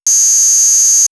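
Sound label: Buzzing, electronic fx; X-Static Goldmine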